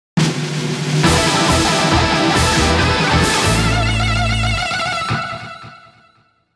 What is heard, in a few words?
Power Activation; Advance Wars 1+2 Re-Boot Camp